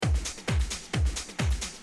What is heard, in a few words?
drum loop